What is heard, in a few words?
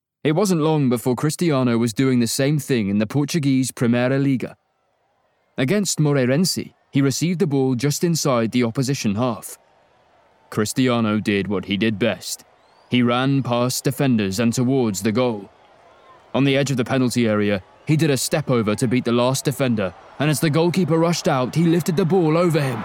Goal Cheer Stadium; Ronaldo